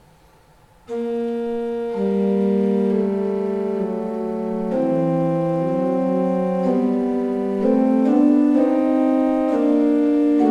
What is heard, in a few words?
Ott, pipeorgans